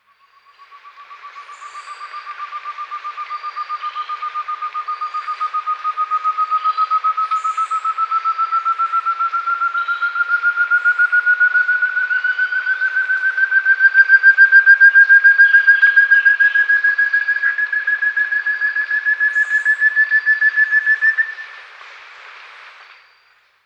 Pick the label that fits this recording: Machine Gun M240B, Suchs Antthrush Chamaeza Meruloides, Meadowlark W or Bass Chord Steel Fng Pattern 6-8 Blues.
Suchs Antthrush Chamaeza Meruloides